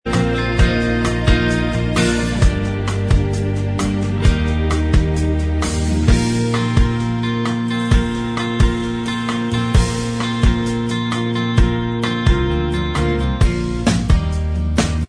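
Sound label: instrumental, track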